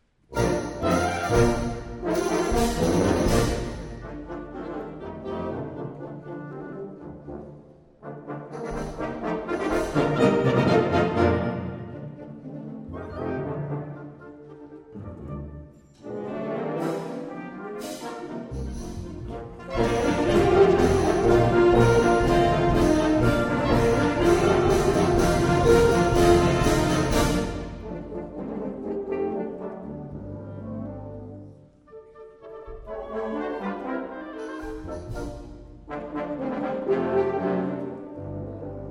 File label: Joyeuse march